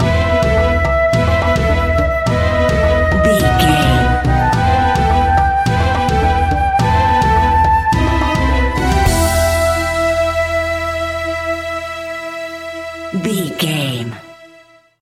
indian music bed stinger, An exotic and colorful piece of World music. Groovy, powerful, exotic and wild.